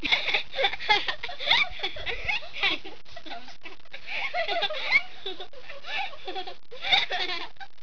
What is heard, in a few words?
KIDS; KRONDOR